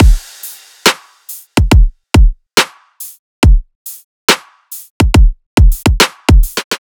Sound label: Riddim Drum Loop 140 Full